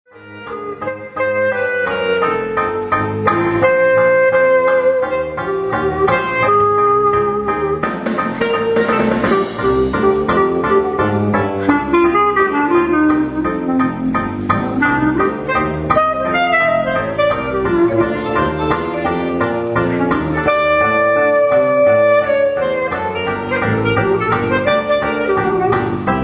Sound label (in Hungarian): Klarinet